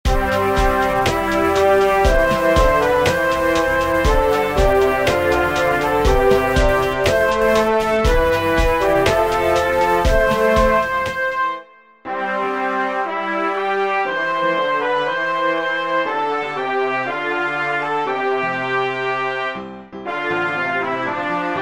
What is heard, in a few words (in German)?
instrum; gedichte; lob gottes